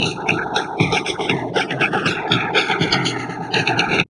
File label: DIST, Drum Loops Crazy Processed